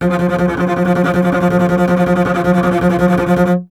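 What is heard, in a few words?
string contrabass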